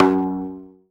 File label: zimbal F#1; ZIMBALON